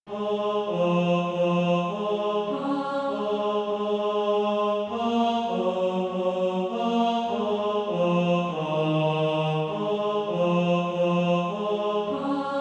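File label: bass; Christ at Bethlehem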